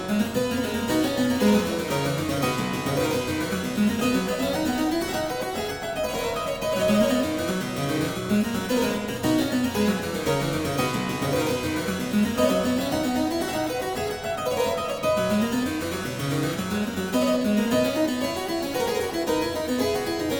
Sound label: Aria Variations in C Major, Harpsichord; More Palantino